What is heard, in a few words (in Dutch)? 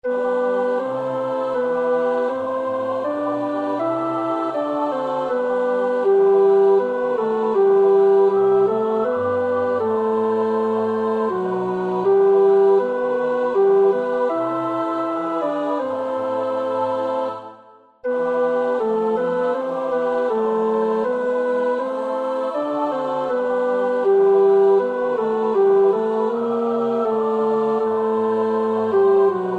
tenor; Reger (Max); 7 geistliche Volkslieder